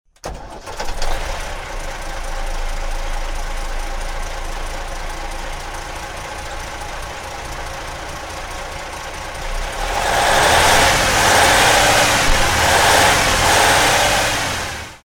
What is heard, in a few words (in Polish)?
autobus jelcz ogorek; dzwonki